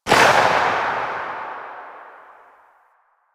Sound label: Epitaph Prime Tail A, Weapons Sidearm; Warframe; Tenno; Wraith Sidearm